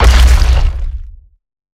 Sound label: Jumpland Walk Run, Behemoth; Evolve